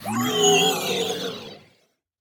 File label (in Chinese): 僵尸吼叫; 守望先锋; 堡垒